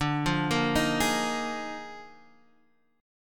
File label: D sharp Major 7th Suspended 2nd Suspended 4th D, chord